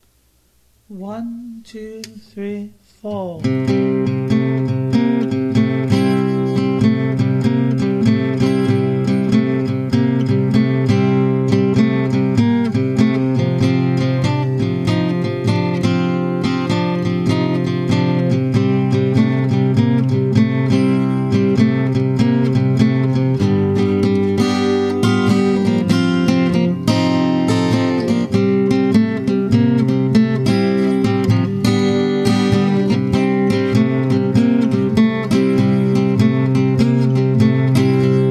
LEAD BLU

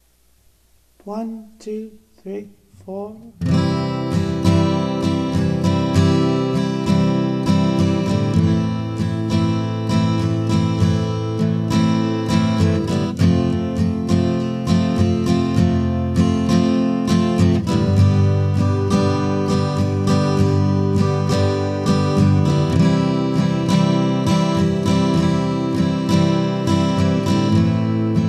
two note

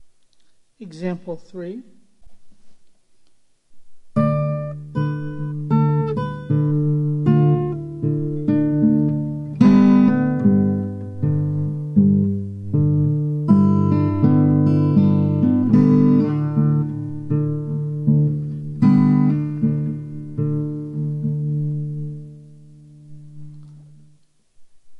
drop D

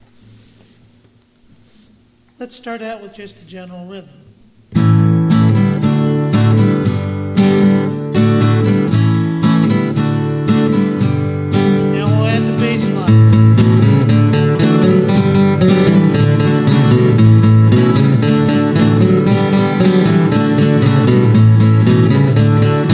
MODE, guitar

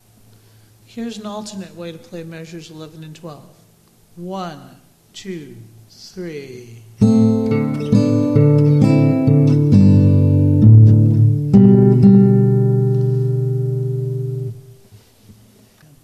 LULLABY

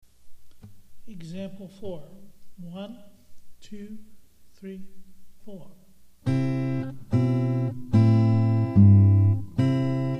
JINGLE

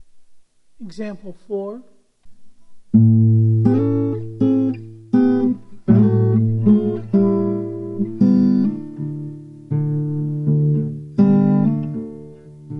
drop D

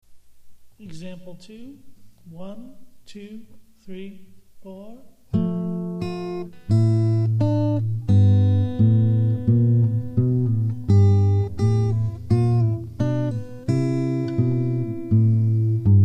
JINGLE

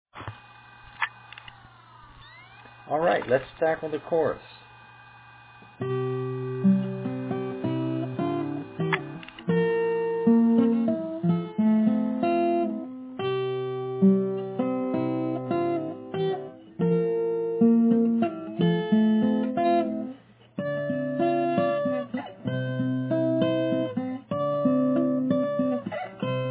Chorus Finger Style

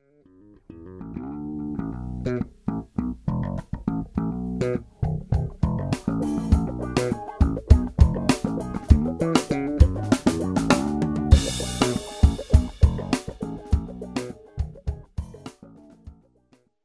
bass

